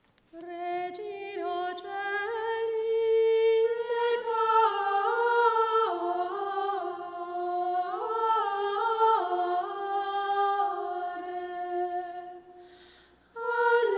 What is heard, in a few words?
Regina Caeli Plainchant Solemn Tone; Zefiro; Marian Antiphons